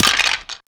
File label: bone heart